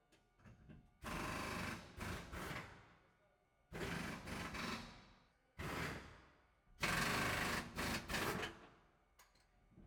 BMW Z4 GT3 wild foley wheel machine interior, racecar; Pole Position